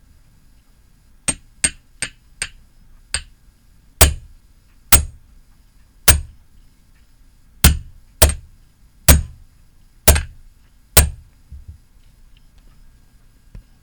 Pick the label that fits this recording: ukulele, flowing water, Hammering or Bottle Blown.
Hammering